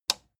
plastic button small simple push

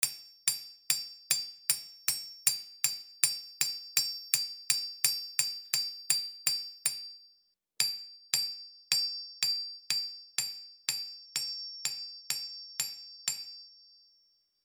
hammering

